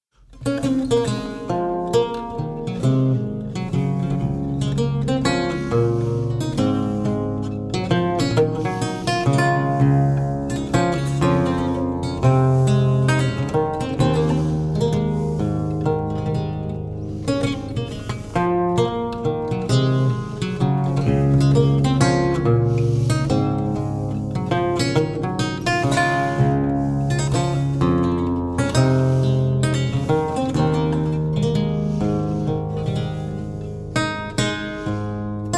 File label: Courante I